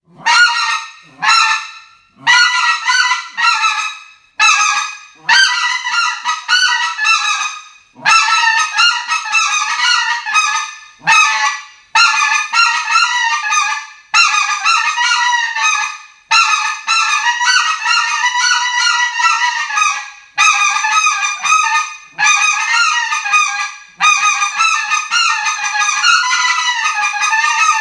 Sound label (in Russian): Zvuk manka BigDog s latunnoj tonovoj doskoj